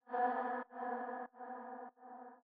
VOCALS Fx Am

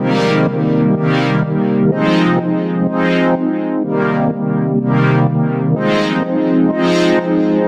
Pad D-

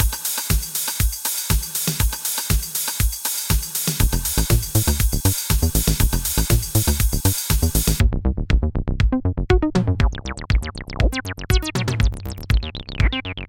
Real Chicago House

